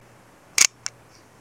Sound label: leica